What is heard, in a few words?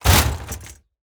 plastic break; teardown ps5 windows xbox series xs gamerip 2022